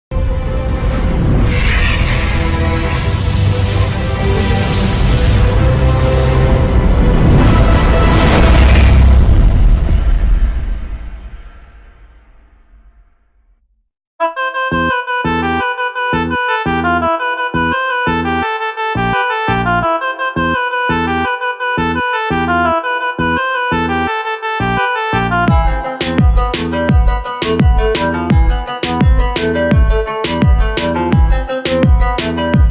минус instrumental

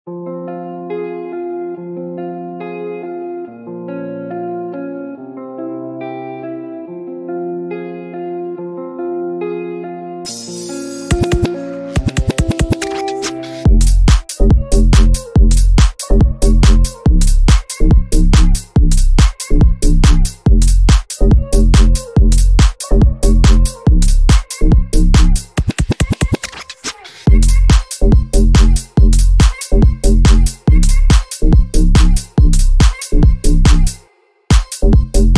минус instrumental

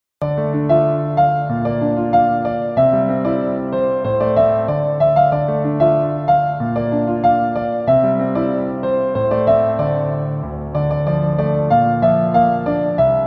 кавер на пианино

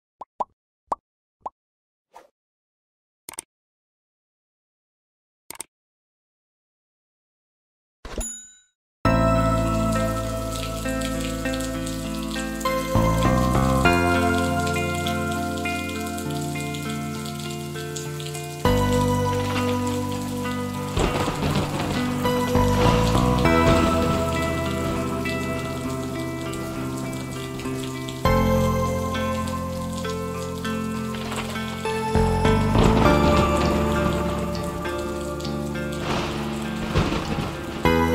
музыка для души

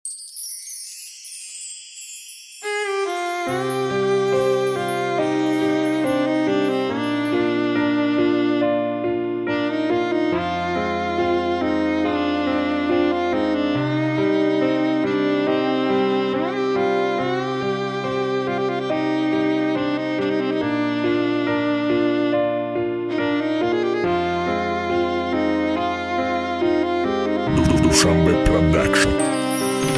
грустная минусовка sad beat

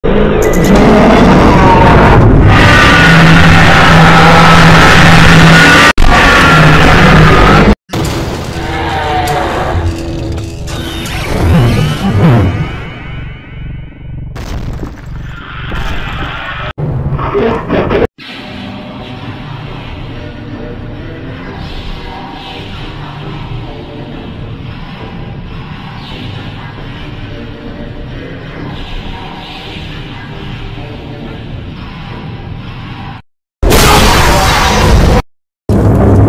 крик оглушение и песня; спикер мен титан

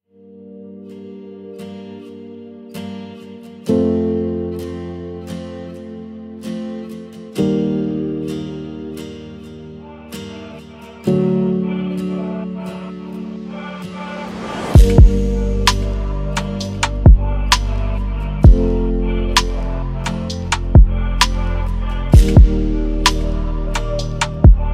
лиричный минус лирика бит type beat

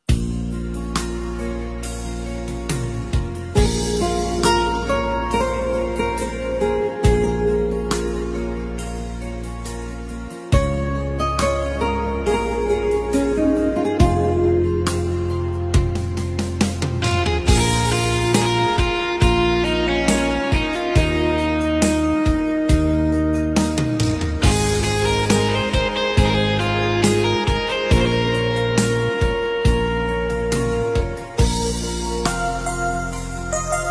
китайский оркестр очень красивая мелодия

